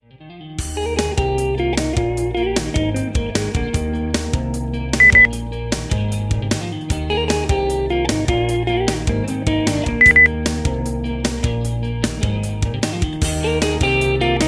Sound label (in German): gute laune beep